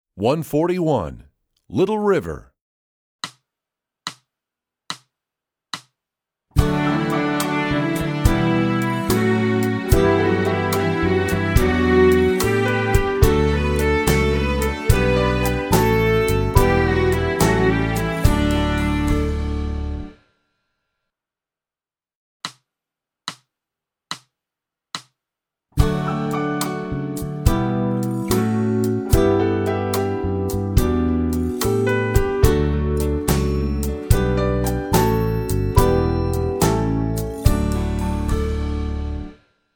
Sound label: Little River, Strings; EE2000 Book2